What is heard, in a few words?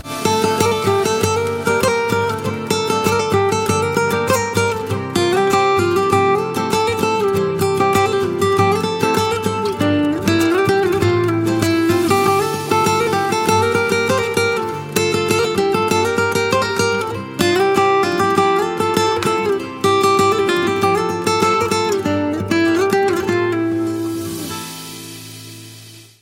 Mobile Ringtone